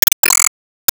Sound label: Team Skill Clock; disney star smash android ios mobile gamerip 2020